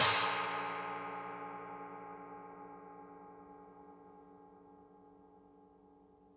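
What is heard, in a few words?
Brushed China Cymbal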